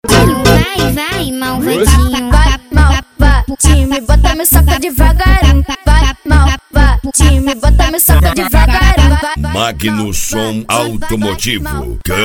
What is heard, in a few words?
funk